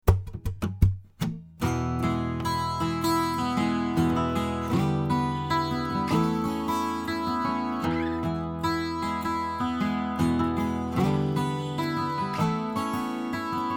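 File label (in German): Taylor12 trocken, guitar; Chandler Limited TG2 500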